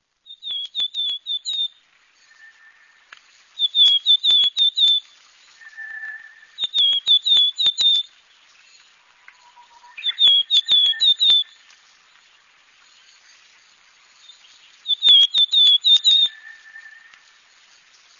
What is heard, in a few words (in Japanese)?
kogara, birds